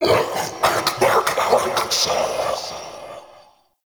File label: Male Vocal Death; Characters; Enemy; Vocals; Mockeries